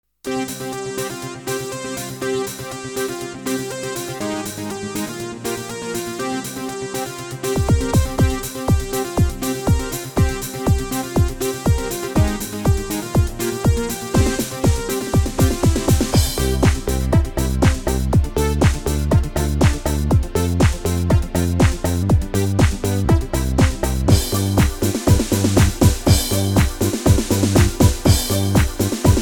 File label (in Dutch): rap instrumentaal